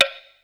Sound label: PC, Casio CTK-5000